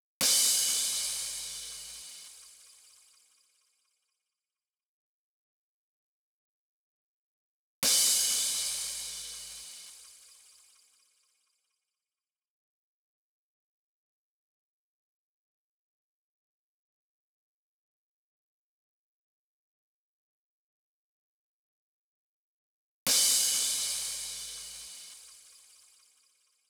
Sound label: Crashes, Future House 126 BPM F; Big EDM; Future House Tools; Construction Kits; Twerk It